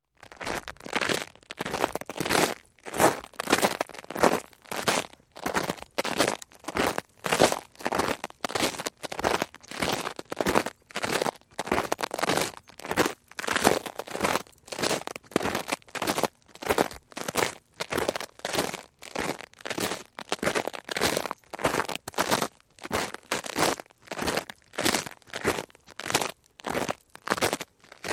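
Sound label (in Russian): шаги по обледенелой поверхности хруст снега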